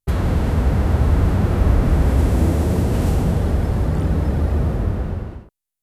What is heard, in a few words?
emotional immersive, podcast